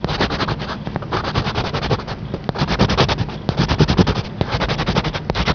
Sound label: Scratch; FRACTIONS PIZZA